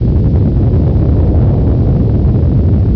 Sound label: quake